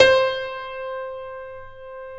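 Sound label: grand piano C5; DANCE PNO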